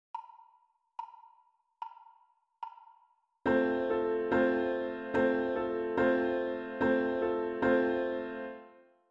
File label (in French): Rythme Bas Piano Seul